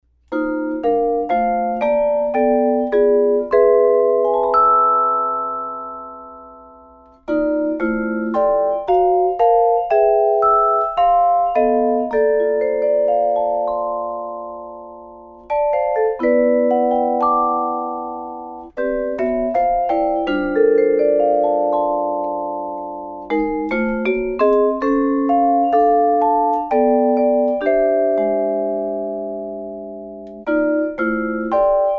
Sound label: solo